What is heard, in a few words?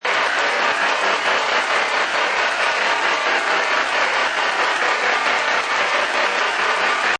Horr Atmo High, Atmospheric horror loop at 135 bpm.; Horror Atmosphere High Loop; Synth Loops